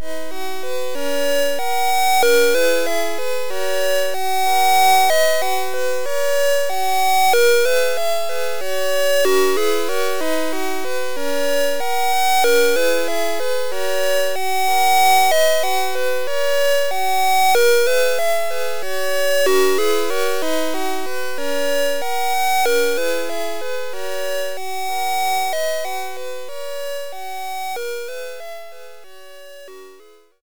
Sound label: Dimension Shift, Game Over; Double Take; C64